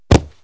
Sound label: Concrete; KABOOM! - Wild and Wacky Sound Software; citylife; crime